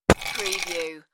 Ice Shk Glass

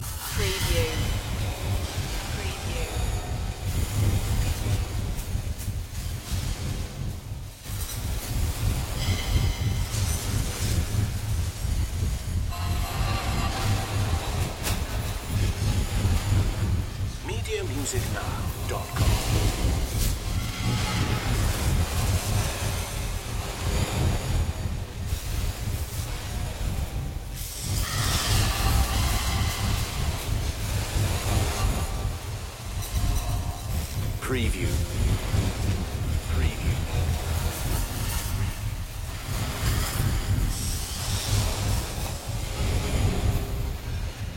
evil chaos background loop

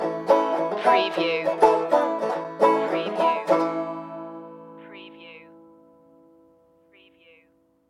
country stinger

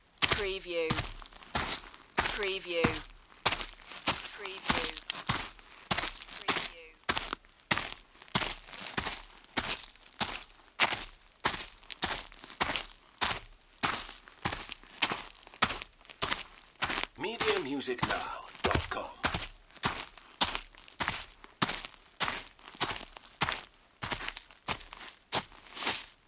Footsteps Dirt Ground With Leaves; Footstep sound effects; lynne publishing

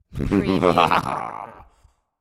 Evil Chuckle

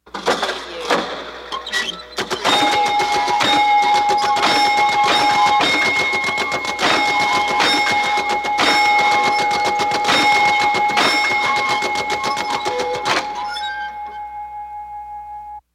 Arcade Game Bells Clanks

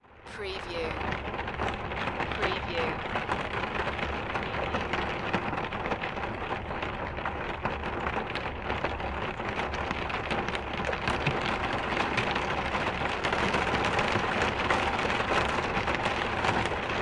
Rain Car Window Int

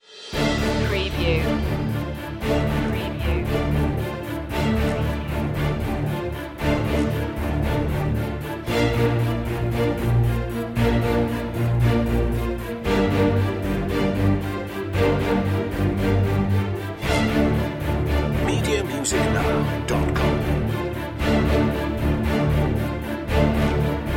orchestral mix high intensity

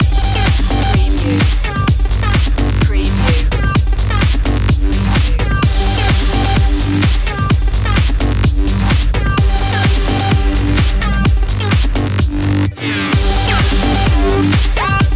high drive

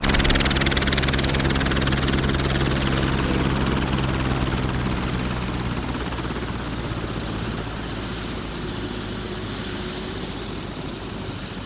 Helicopter Close Landing Sequence

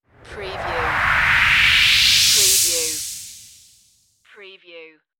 Rush Long

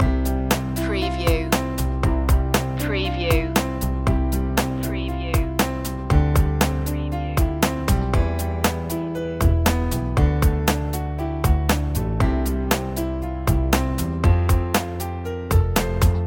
loop, chill

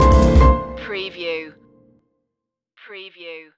jingle bells jazz stinger

